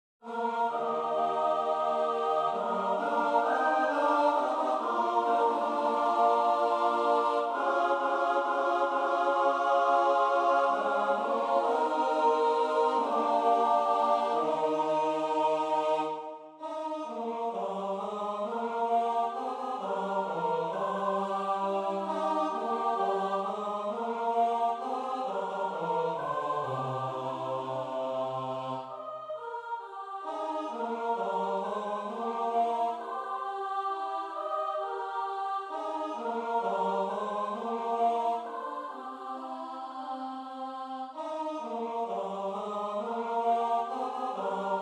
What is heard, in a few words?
satb; as dew in aprille